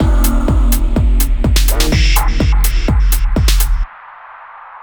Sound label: Techno Kit; Berlin